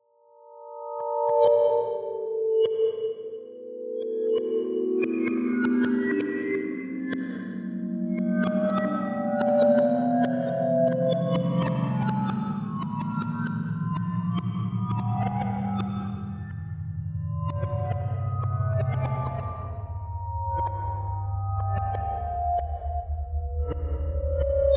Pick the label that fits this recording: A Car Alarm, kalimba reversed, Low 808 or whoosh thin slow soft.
kalimba reversed